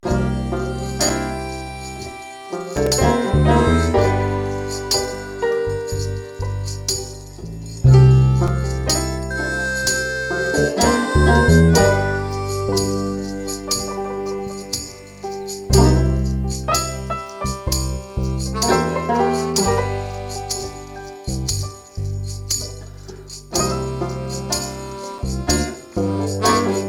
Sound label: nude loop; Nude Loop #1; Nude Loops